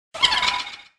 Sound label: Laugh; Muffet